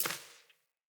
Sparrow Step Default, Footsteps; Animals

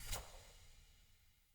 UI Default Theme Grid Close

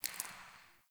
Derelict Interior Water Drip; Ambience; Warframe; Orokin Derelict

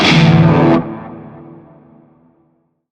Shawzin Pent Maj Chord; Warframe; Narmer Shawzin

